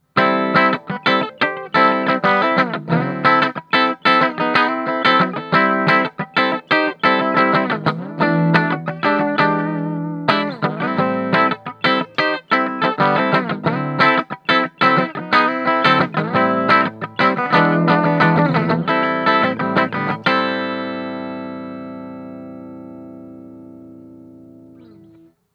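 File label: funky bridge, Clean Funk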